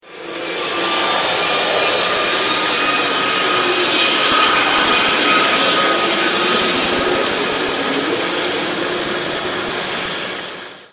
speedboat, Salish Sea sounds

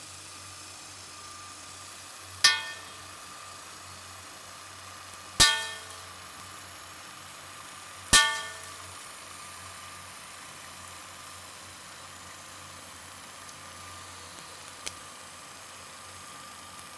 two Hanging Methods, oikomi pipes, anthrophony

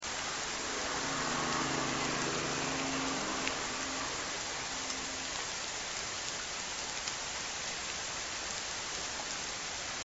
airplane

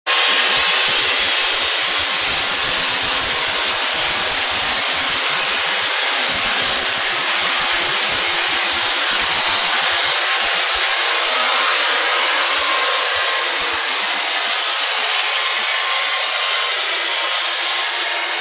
limekiln loud boat

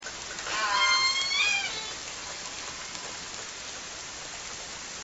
J S4 S1 combo, orca call

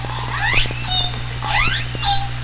Orca Calls; SRKW

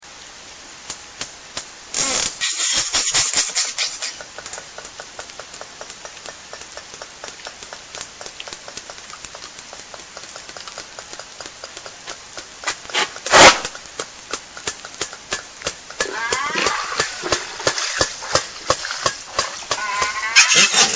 close pass

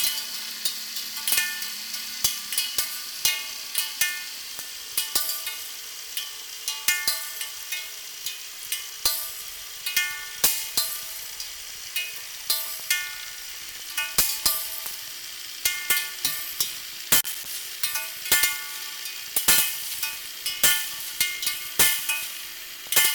one Minute Of Pings, anthrophony; oikomi pipes

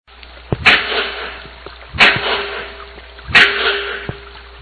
pile driving; MWWG noise indicator

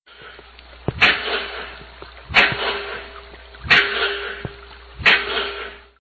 pile drive, Salish Sea sounds

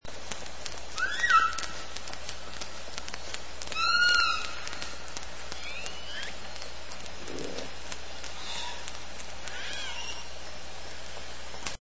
clear calls

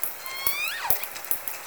call, Orca; SRKW; Alwin

